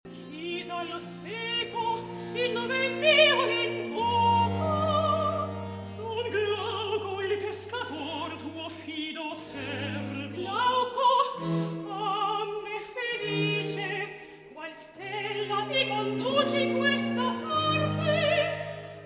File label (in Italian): Recitativo; Chi Dallo Speco Il Nome Mio Invoca; Polifemo